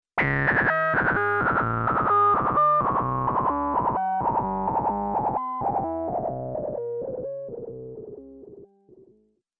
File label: TRACK AND HOLD